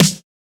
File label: Snare; FLPRO; Snares